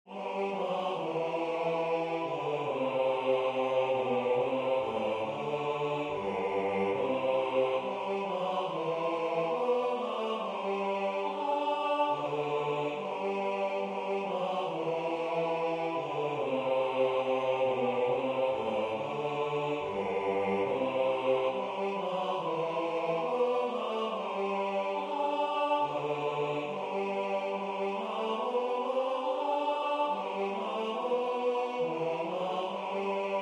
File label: bass; Christmas Oratorio 59; Waiheke Island Choral Society